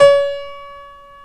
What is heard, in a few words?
PIA SYN; Best Service Gigapack 1